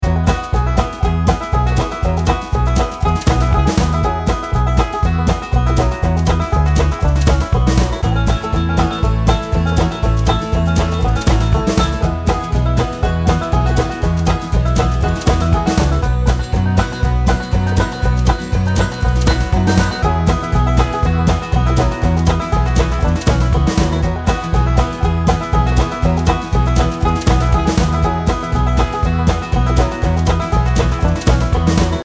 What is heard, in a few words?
Banjo